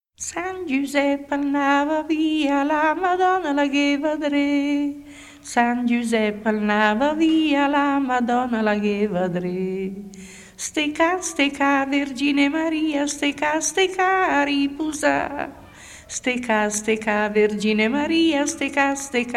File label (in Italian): san Giusep andava via; brianza